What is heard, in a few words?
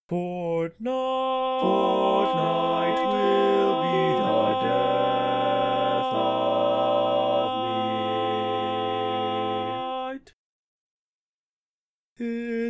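baritone, barbershop tag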